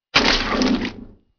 splat

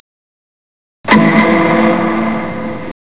gavel

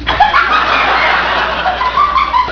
Laugh

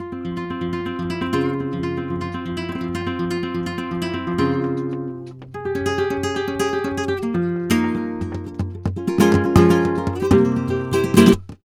Bulerias

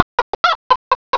chicken

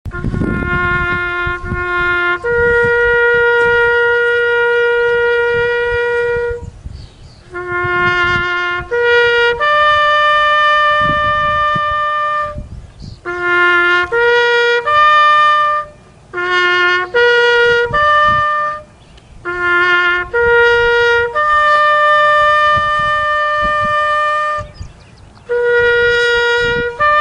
taps; billmclean

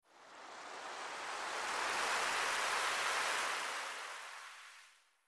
seashore sound

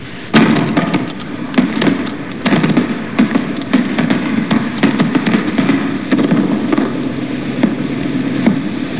firework; Bday

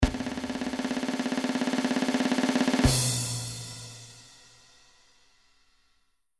drumroll, midi

